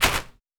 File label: Snow